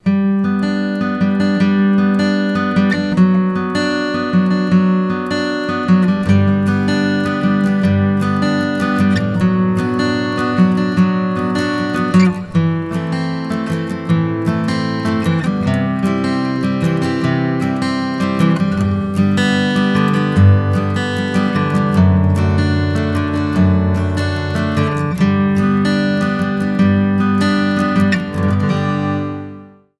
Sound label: Fresh Air ALT (no pads)